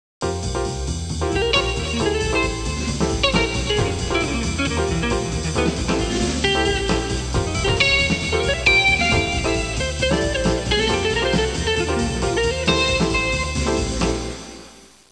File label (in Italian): musica urban